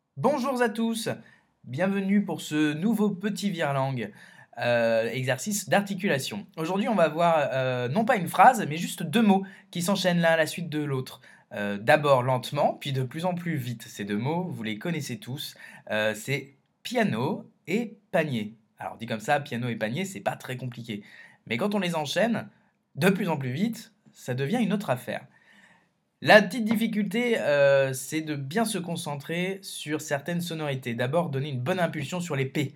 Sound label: Piano